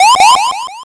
warning

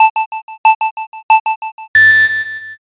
countdown

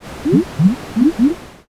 poison

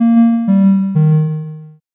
picosad

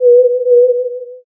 owl

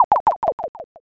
dice

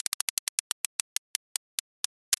roulette

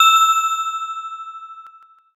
handbell

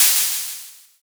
open hat

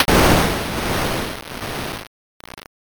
thunder

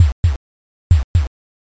heartbeat

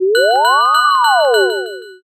firetruck

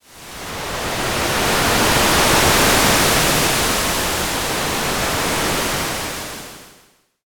wave

